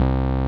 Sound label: Roxichord, E.Piano; Roland LCDP10 Keys of the 60s and 70s 2